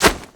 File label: whistle; buckler